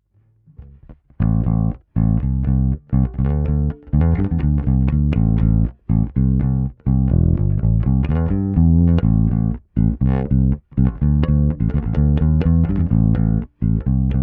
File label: ampeg PF 50t P Bass Finger